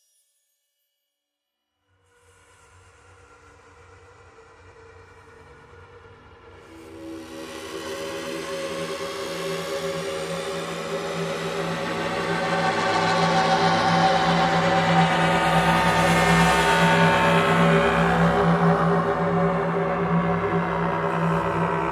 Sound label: Drones Distorted; Gothic Storm Music; Advanced Sound Design 2012